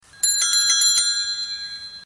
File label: Sms Bell Tone